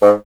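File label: wind bassoon G; Miroslav Vitous - Solo Instruments VOL-1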